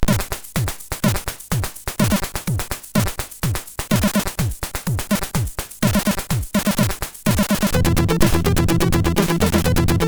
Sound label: Short Techno sid MOS6581